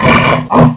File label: jump, demon; Quake; PC Gamer 51